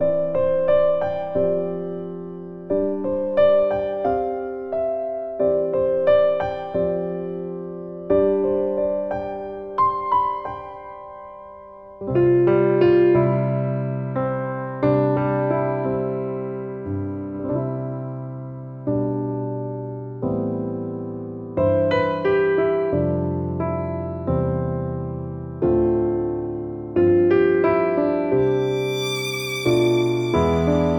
S.Piano Ver.; sanse hui lians sunnyrain lovestory 2017; 三色绘恋

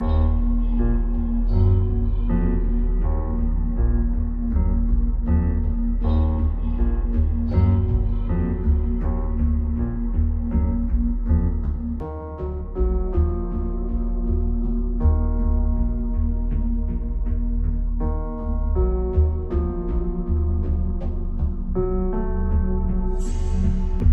Hideout Suspense; Hello Neighbor 2

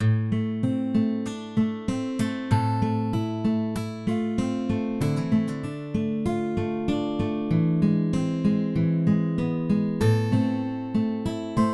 Guitar; Next Day Survival

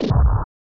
Hit Ground

